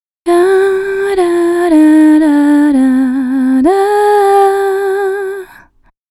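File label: API 3124 Female Vocal more Gain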